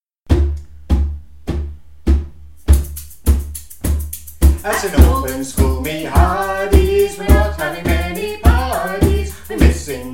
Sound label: Lockdown Song; St albans